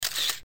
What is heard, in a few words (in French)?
Appareil photo